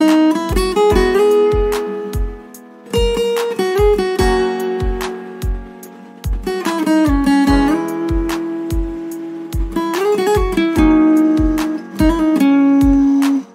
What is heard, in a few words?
guitar music ringtone